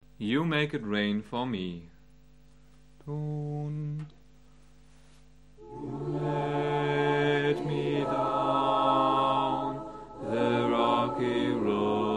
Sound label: Bass